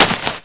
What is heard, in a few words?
player grass; svencoop; sence; mistake coop